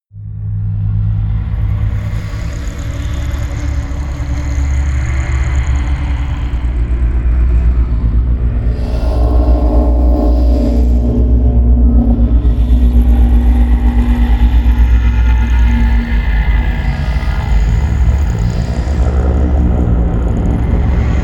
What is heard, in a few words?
drone bowels